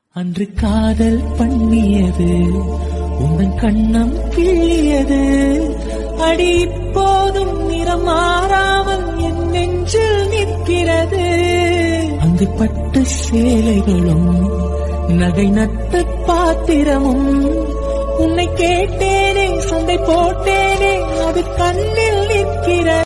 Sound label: tamil song